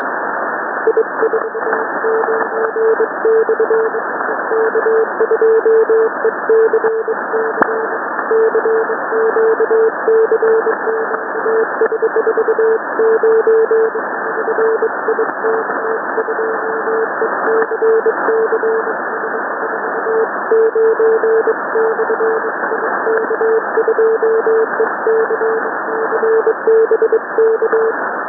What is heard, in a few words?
sending random prefixes